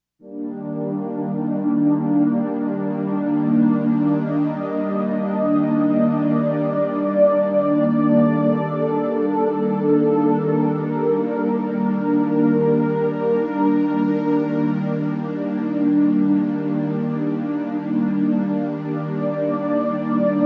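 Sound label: Synth Horn Pad; Roland SC-880; Factory Patches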